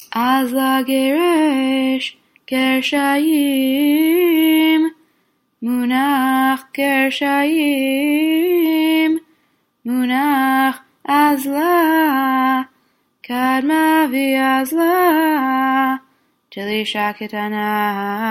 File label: polish lithuanian eicha אזלא